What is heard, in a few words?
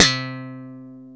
C-2 SLAP, BASS; AKAI S-Series CD-ROM Sound Library VOL-8; SET#1 BASS